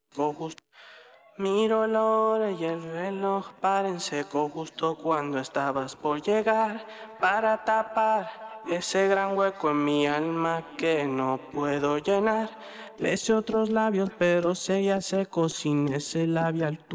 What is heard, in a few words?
vocal